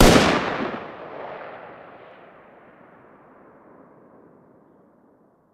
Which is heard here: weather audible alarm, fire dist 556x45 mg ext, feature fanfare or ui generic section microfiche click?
fire dist 556x45 mg ext